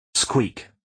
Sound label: squeak